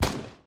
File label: shoot